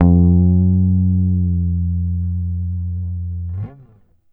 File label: GTR TRIK, Jazzistic; Univers Sons